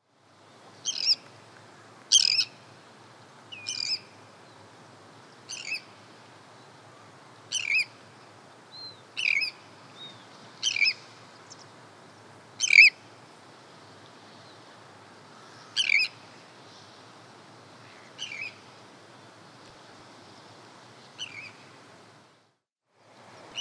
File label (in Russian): pestronosaya krachka golos